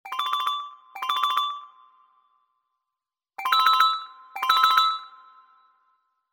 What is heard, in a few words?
hotline ringtone, nokia